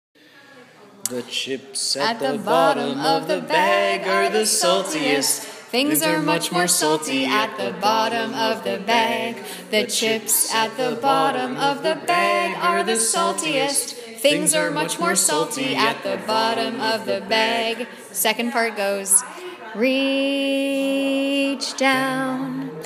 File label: potato chip song